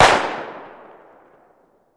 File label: Gun Shot Sngl Fire